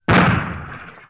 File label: FIRE; TOAW